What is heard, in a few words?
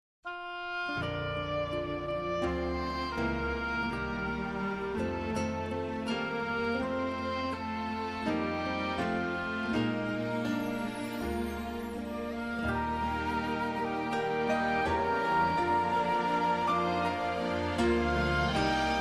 Soprano, America the Beautiful, Choir Rehearsal Parts